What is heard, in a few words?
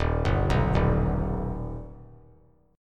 Eb Augmented Eb; arpeggiated; JGuitar